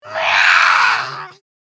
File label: Little girl angry shout